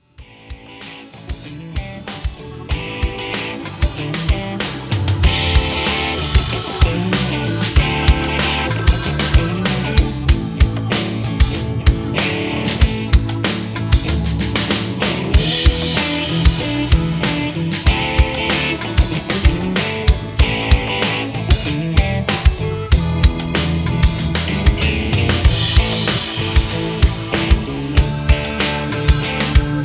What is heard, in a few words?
Rockin Techno Toys Blues in A